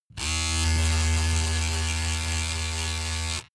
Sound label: razor on load pov short